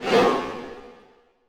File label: STRINGACCENT, Orchestral Loops; Best Service ProSamples vol.33; AKAI